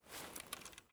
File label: gesture sound; Gesture System